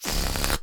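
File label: Balloon deflate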